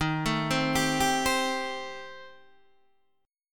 Eb 6th Flat 5th Eb, Guitar DADGAD; down

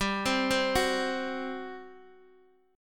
G Major 7th Suspended 4th, Guitar, Open D

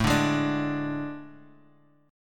A Major 7th Suspended 4th

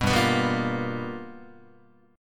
G 7th Sharp 9th G chord, Guitar

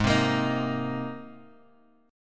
G sharp Major Add 9th G sharp, Guitar Open G

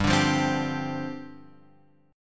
G 7th Suspended 4th G down Guitar Open F; G7sus4 strummed

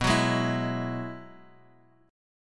B 6th Suspended 4th B, guitar chord; B6sus4; strummed

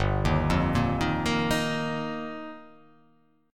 G Minor 7th Sharp 5th; Gm7#5; arpeggiated; JGuitar; 3,6,3,3,3,5,5,x

